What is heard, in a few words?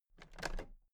toolbox latches open very soft